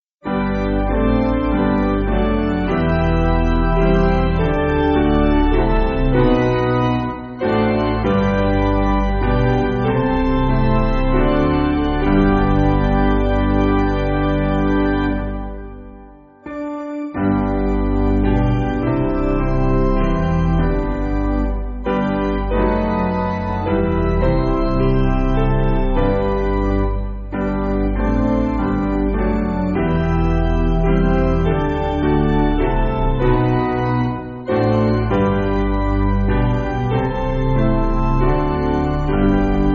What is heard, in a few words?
Piano, Free Music Backing Files for Churches